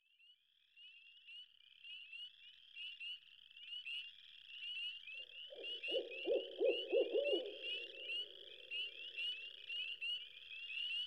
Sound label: Celtic Quest